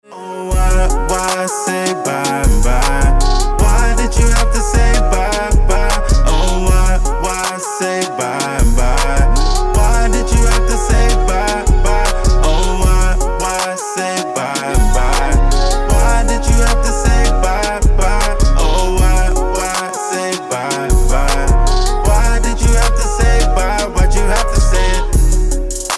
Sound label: HIP HOP